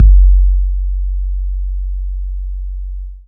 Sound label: Bass; 7rystan's 700+ FREE COLOSSAL EDM SAMPLE PACK